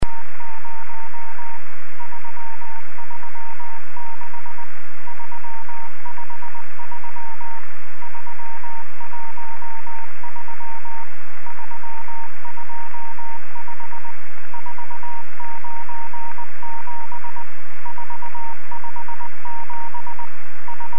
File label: beacon, amsat